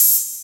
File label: HH, Dance Mega Drums; Best Service; HH HOU